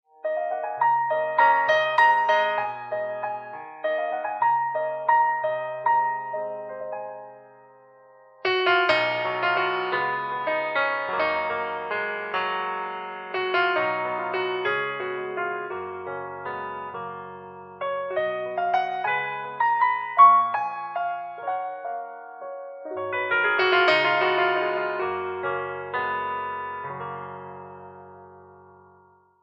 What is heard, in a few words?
Emotional wave, ringtone